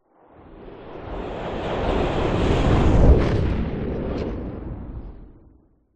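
sound design cinematic whoosh wind rush into boom aggressive powerful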